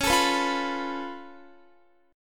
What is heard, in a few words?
Db Augmented 7th Db down Guitar; Db+7; strummed